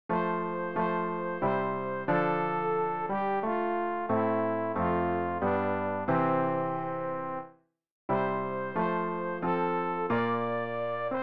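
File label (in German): sopran, Übehilfen für das Erlernen von Liedern; ref gesangbuch; rg 276 such wer da will ein ander ziel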